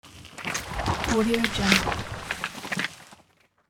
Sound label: ice forming; hollywoodedge